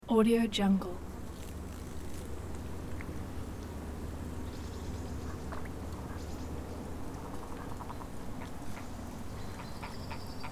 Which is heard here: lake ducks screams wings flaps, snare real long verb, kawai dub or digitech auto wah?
lake ducks screams wings flaps